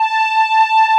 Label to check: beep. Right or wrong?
Right